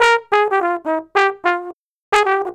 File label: Trombone, Jazz; Sonic Foundry (Sony Creative Software); Jazz Solos and Sections